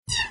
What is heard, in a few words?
Laser